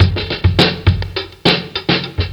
fx, Beat Loops, 103; Zero-G; Monster Beats

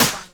KIT, Dance Mega Drums, HIP; Best Service

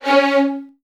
C#1, Strings, VN 16TH; ILIO; Kirk Hunter

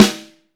MAPLE SNARE

